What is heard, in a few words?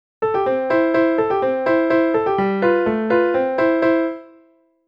Piano Practice; FINAL FANTASY III Original Soundtrack (NDS)